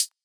Hi hat, Closed hi hats

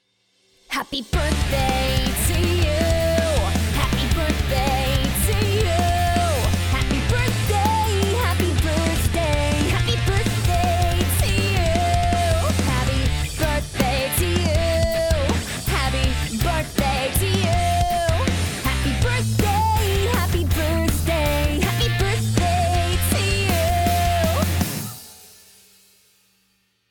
Happy Birthday Song in English Rock Band

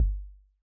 808 kick, Kick drums

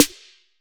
Alley snare, Snare drums

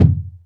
Earth kick, Kick drums

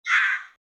Blackbird calling; Blackbird calling sound effect

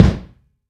Thin kick, Kick drums

